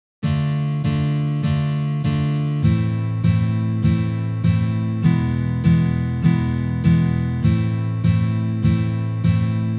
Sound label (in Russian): Uprazhnenie dlya igry akkorda Re minor na gitare